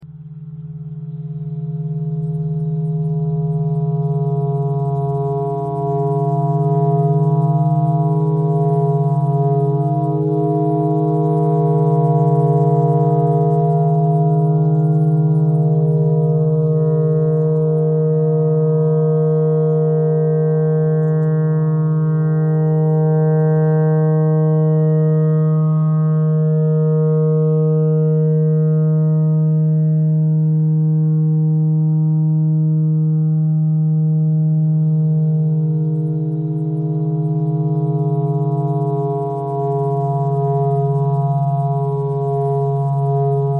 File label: clarinet notes e clarinet thread simple